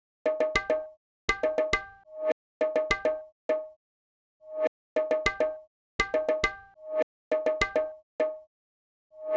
Percussion A, Emin

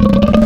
Burp; Cymatics; Vomit Wavetables; Serum

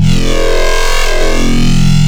Growl Pass; Cymatics; Growl Tables Vol 2; Serum

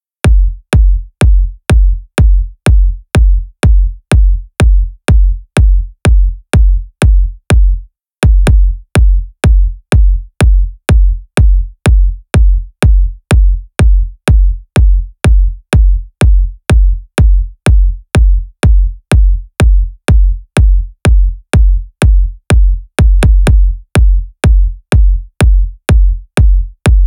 Kicks, Future House 124 BPM; G; Big EDM; Future House Tools; Construction Kits; Lion

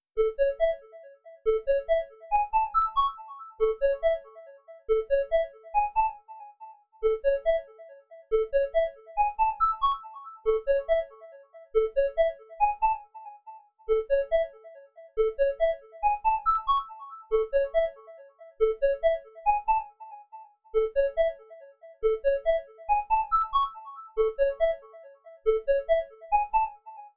140 BPM F# Min Triangle; Cymatics; Destiny Gold Expansion Stems; Cherry Soda

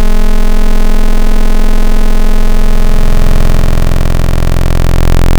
Basic Quantize, wavetables; Cymatics; Nightmares Vol 2 Wavetables; Serum

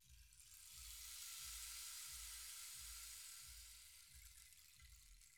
Rainstick, Hip Hop Exotica, 089 Amin; Big Fish Audio

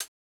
Hat Hit, Bmin; Hip Hop; Hip Hop Exotica; Apple Loops; Big Fish Audio

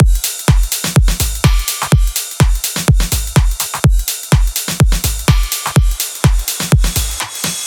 Big EDM Drum Loop Mixdown loop, 125 BPM; Future House; Future House Tools

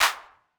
Clap Hit; 098 Amin; Hip Hop Exotica; Big Fish Audio

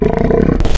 Pig, Animals Wavetables

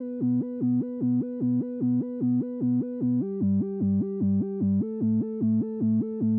Synth

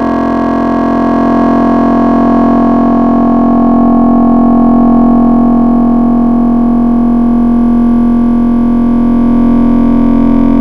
Square Tuned, Wavetables; Cymatics; Future House Wavetables; Serum

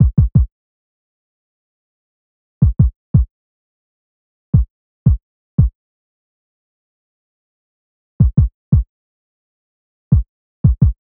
Kick, 086 Emin; Hip Hop; big fish audio; Hip Hop Exotica; Apple Loops; Drum Tracks

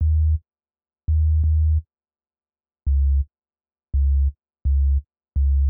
Bass; Hip Hop; Apple Loops; Big Fish Audio

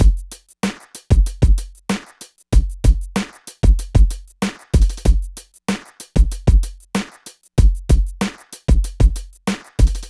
Drums, 095 Emin, Hip Hop

